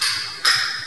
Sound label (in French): bruit